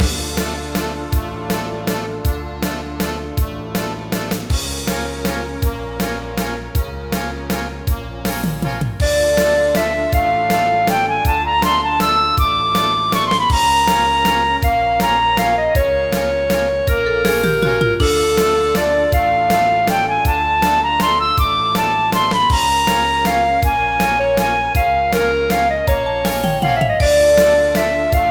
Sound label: waltz of the ghosts